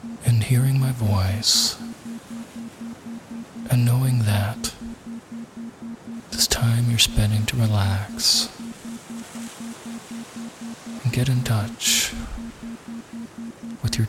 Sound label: Deep Relaxation With Isochronic Tones